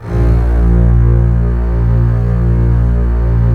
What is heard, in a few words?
STR CBS, Roland LA Composer; Roland - LA Composer vol. 4